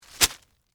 Impact Cabbage Head, Drop, On, Cabbage, Leaf, Pile; Texture; Vegetable; Drop Sound Effects; BlastWave